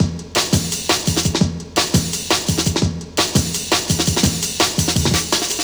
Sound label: Dope Break, Drum & Bass; Fluid Dynamics